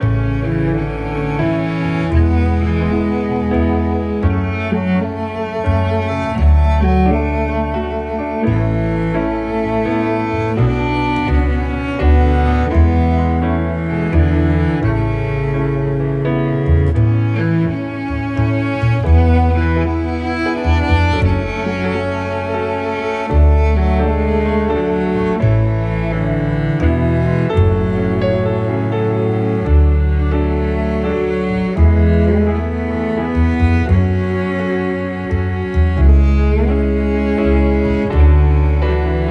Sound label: Orchestral Strings Cello Duet 85 bpm; Father Daughter Wedding Dance